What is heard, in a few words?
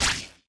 shot